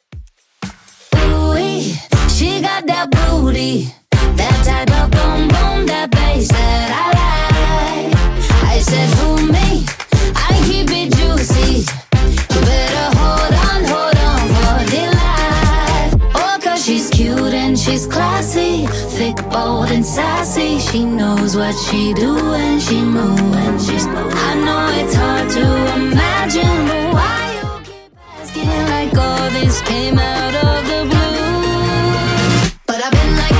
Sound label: Top40 Redrum 120bpm